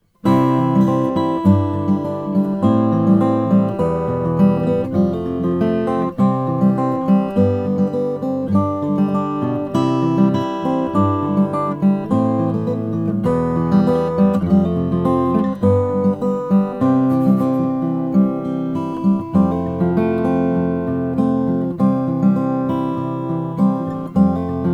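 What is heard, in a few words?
santa cruz omg indian rosewood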